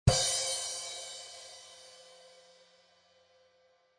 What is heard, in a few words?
Kick & Cymbal; Loopology